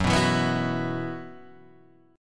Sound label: B Suspended 4th Gb